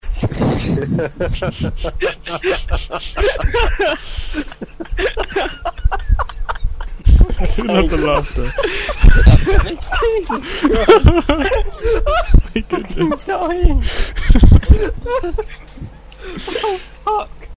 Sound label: laughter